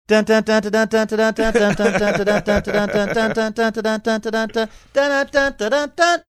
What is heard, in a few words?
singing fast paced rhythm first notes; super mario